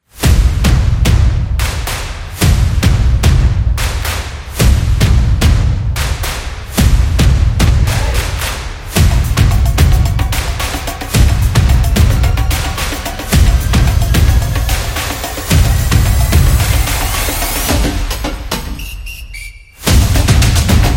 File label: Football Drums